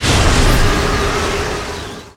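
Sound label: Swoon Explosion; Baphomet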